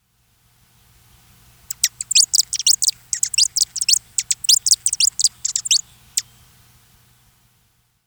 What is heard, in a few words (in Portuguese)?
beija flor tesoura